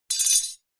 household kitchen knife metal set down on hard surface; zapsplat